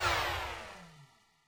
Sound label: Aircrafts Air Inlet Screen End; DCS